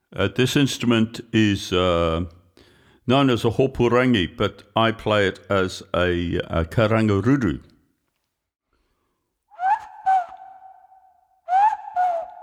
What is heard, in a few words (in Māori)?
Karanga Ruru